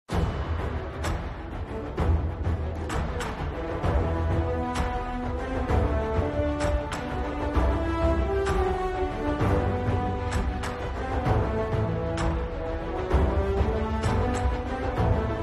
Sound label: bgm